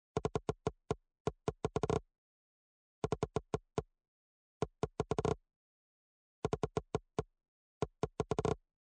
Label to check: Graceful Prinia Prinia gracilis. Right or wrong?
Wrong